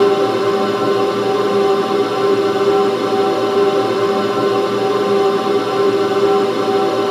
Texture Loop, Techno; Ghosthack; AC2024 - Day 1 - Techno Sounds 2025

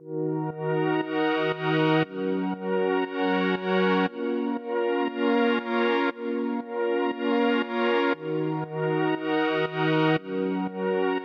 118 BPM A# Min Pad; Melody Loops; Loop Stems; Hip Hop; Cymatics; Nueva

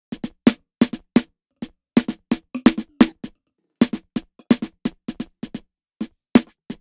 Snare, 130, Shuffle; Drums; Separated Drum Loops; Snares; Ghosthack; Drum Mega Bundle; Abstract Drums Volume 2

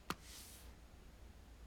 Hand Sliding on Bed Sheet; Fairlight Sound Lib

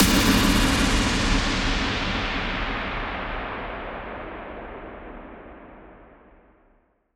Impact; Cymatics; Hits

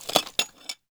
Box Raise Latch; Game Essentials

